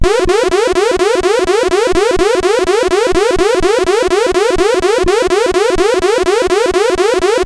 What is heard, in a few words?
Trance, CSG8580R5; Trance Sector Ultimate